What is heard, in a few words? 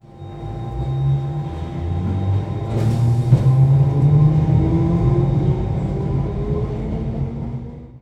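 d stock, train; Simutrans; Pak128.Britain-Ex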